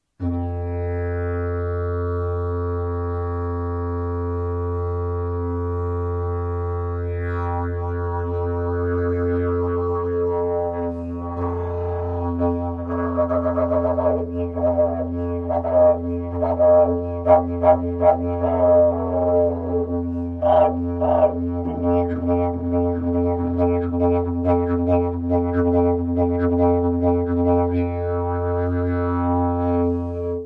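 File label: pvc F, didge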